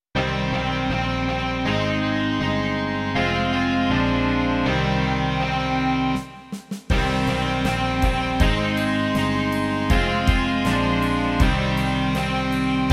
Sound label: rock